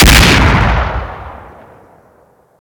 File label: attack